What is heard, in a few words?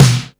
Snares & Claps; Vengeance Samples